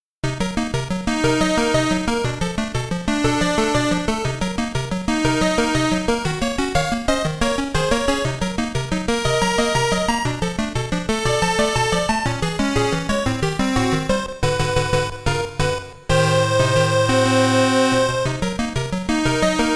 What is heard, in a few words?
Fifth Stage BGM; Mazed Music; Tentacle; Konami Music Masterpiece Collection